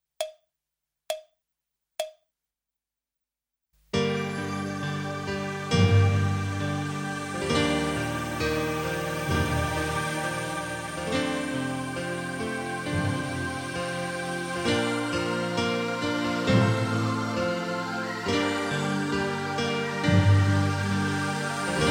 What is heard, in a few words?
(karaoke); Slayers